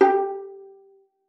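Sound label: violin pizzicato G4; Xsample VOL-5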